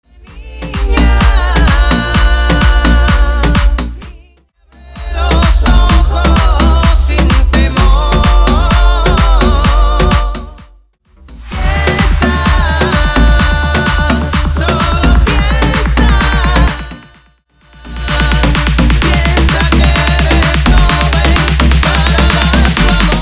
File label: Waracha Remix Intro Outro Clean 128 BPM Waracha